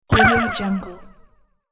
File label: kill hit; sonic-boom